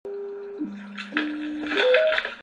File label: incoming call; kitt